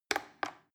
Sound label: plastic button little click regular slow